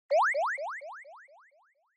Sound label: Anime Motion Delay